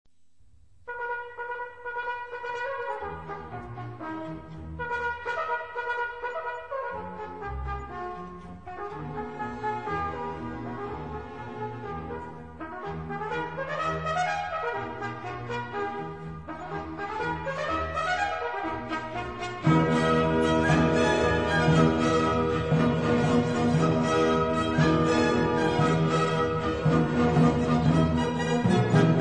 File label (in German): trompete